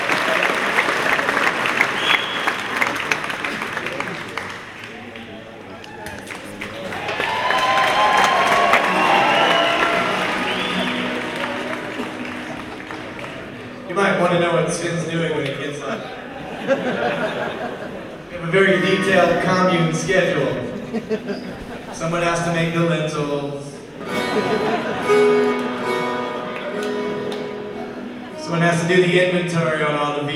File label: Thunderstorm